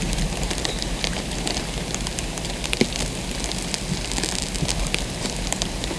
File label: amb ember loop, fire; Portal 2